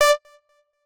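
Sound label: Beep Lead High D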